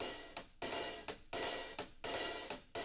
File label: HIHAT LOOPS, Living Drums